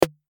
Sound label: snare